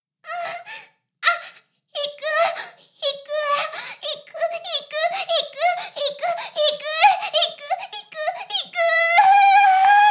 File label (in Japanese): Ｈな音声; ダメなメイド